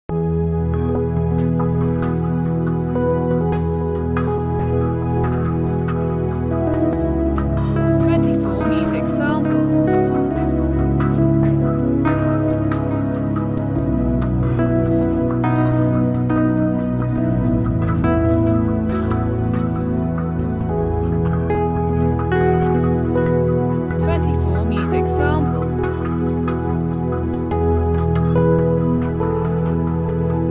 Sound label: love story synth